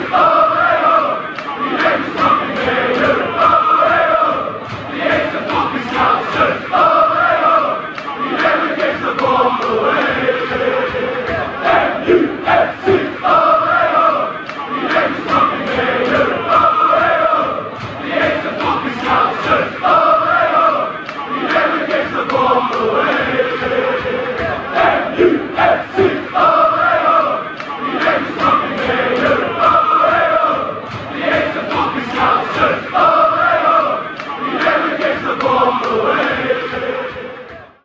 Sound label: player chant; kobbie mainoo; manchester united; united kingdom